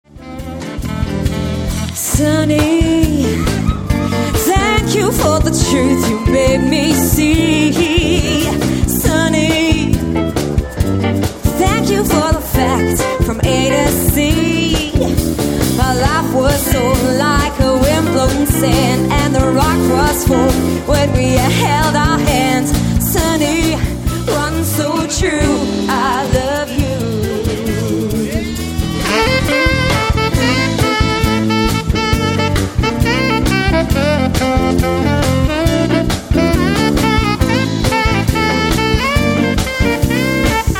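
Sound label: Quintet (voice, sax, guitar, bass, drums)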